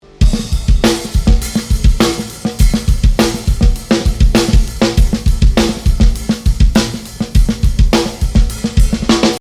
snare comp add, batterie

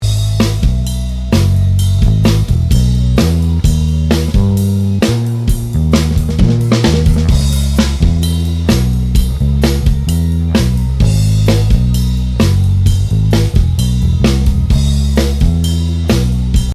full over comp, batterie; la compression sur les OH a encore une fois de plus joué sur la sonorité de la Caisse-Claire

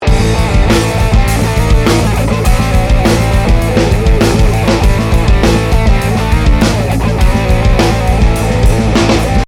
full over comp, batterie, reverbe-gate; une autre reverbe-gate (Lexicon)

